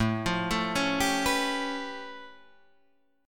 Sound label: A Augmented 9th A Guitar Open D